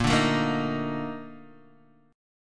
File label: Bb Major 11th Bb down Guitar Half Step Down